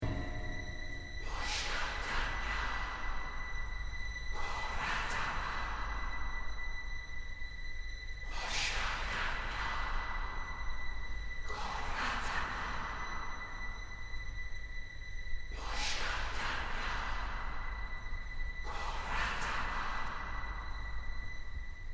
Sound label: Darth Maul Chant